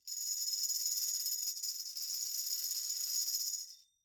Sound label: fill; souichirow adachi works